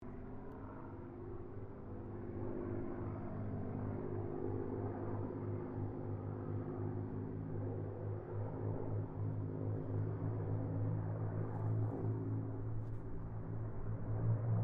AIRCRAFT SPITFIRE MUSTANG KITTYHAWK PASS DISTANT; Mixed aircraft squadrons; Airplanes (Sound Effects:Vehicles)

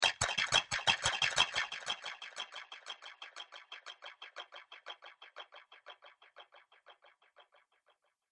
Arcade Game Mechanical Bits; Video Game Sound Effects; Retro Inspired Sound Effects - Think Nintendo, Atari, C64, Etc.; Andrew Fleming

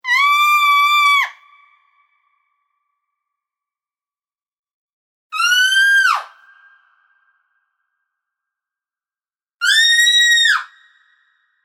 Female Screams, 3 x female screams; Screaming; Voice

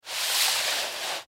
Manual Grab Slide Book Pamphlet; Paper; Office; Blastwave

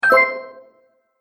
Magic Purchase Brief Ding; Cartoon Sound Design Sound Effects

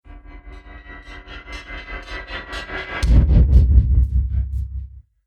Sinister Horror Impact; miscellaneous impact; Miscellaneous Impact Sound Effects

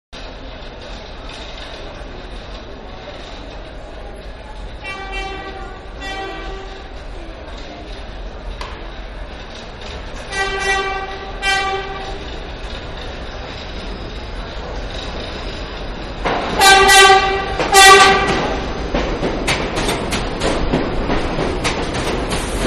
Subway Platform Ambience; Vehicles; Subways; Manhattan Production Music

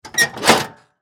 Lawn Mower Blade, Level, Lever, Crank, Hard; John, Deer; BlastWave

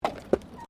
Tram Horse Drawn Horse Step Gravel; Horse drawn tramcar; Carriages; Vehicles

